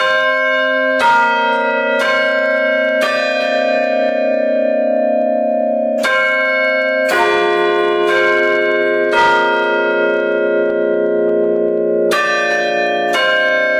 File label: Madonna Quarter